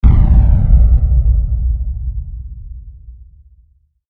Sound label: Hit Deep Phaser; Deep Tones; Blastwave